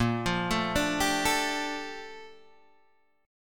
Bb Major 13th, Guitar, Open G

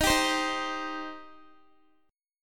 Eb 6th Eb down Guitar; strummed

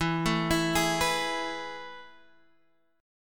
A Major 7th Suspended 2nd

